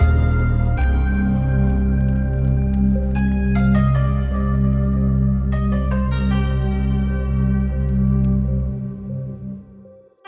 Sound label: Broken Piano; BB 2HTC Samples Vol 4; Solos